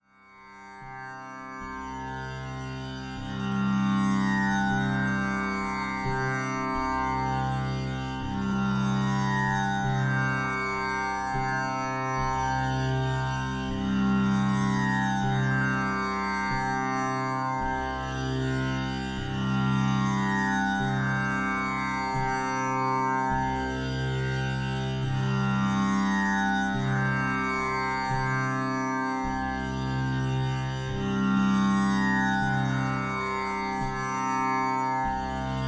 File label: Tanpura Sample